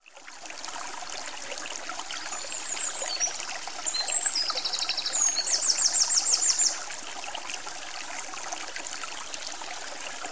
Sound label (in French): Ruisseau en forêt